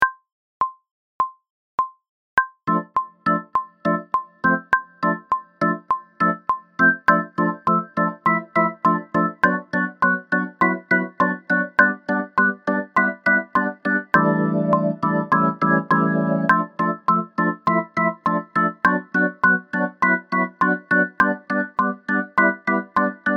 Organ

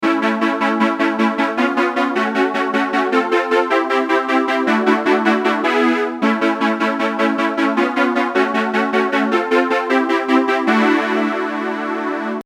Backing

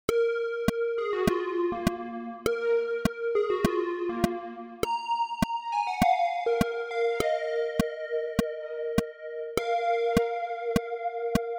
C melo

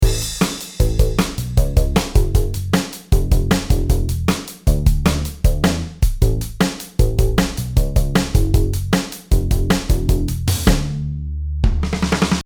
Drum Bass

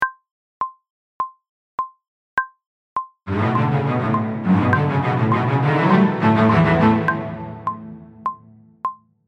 Low St

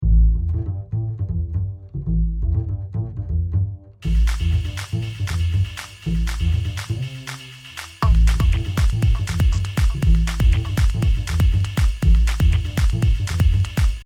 bass perc

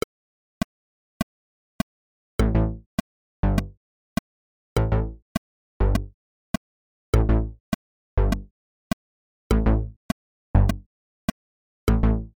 Bass

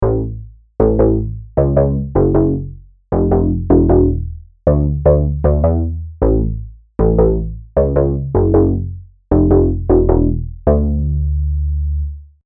Bass

